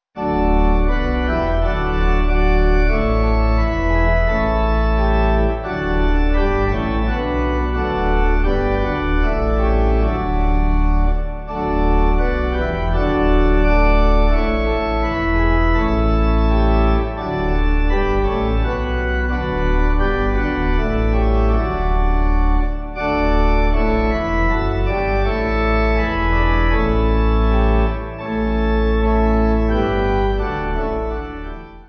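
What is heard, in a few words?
pipe organ hymn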